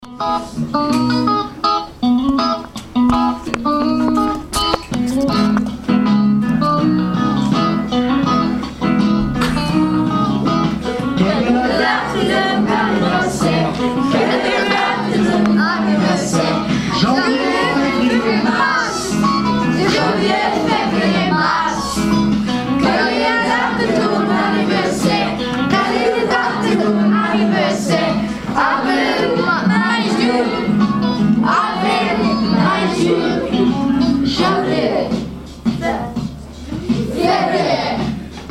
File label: birthday song